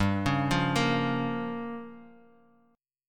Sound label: G Minor 7th Flat 5th, Guitar Open D